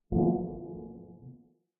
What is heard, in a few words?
Ship Turbulence